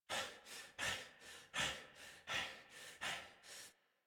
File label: Breath Heavy Fast, Adult; Warframe; New War; Adult Operator